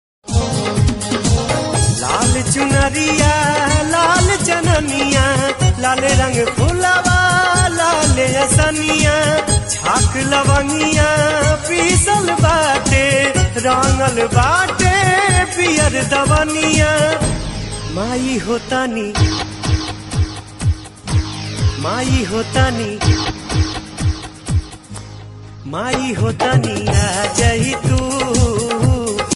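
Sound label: bhojpuri devotional ringtone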